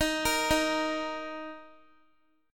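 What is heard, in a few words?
E flat 5th E flat, down, Guitar; JGuitar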